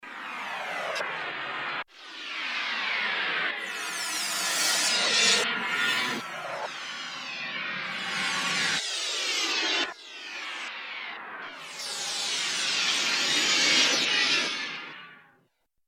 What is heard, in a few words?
drum rev, roland jv880; cymb reverse